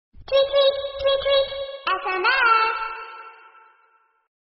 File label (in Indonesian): nada dering cuit cuit sms